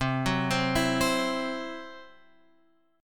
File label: C-Major Flat 5th, guitar; CMb5 Chord {8 9 10 9 X 8} arpeggiated